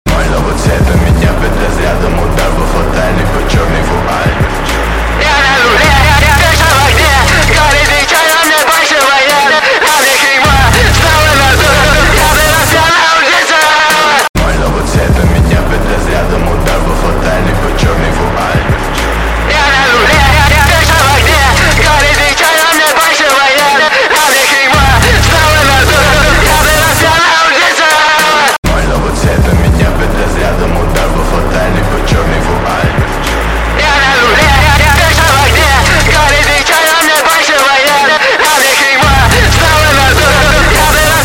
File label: noise cult